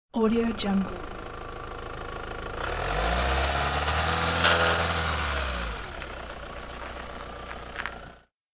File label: squeaky belt diesel car departure from parking; fxprosound